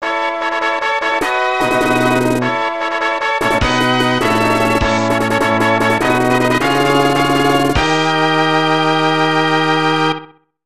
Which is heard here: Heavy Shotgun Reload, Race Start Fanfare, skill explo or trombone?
Race Start Fanfare